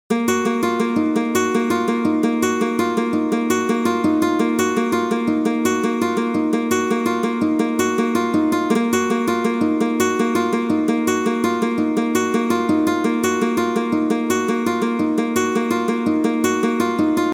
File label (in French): guitare